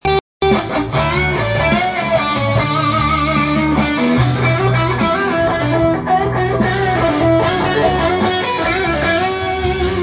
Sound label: guitar solo